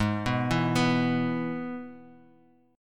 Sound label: Gsharp Minor Sharp 5th, Bass Standard; arpeggiated; 16,14,14,16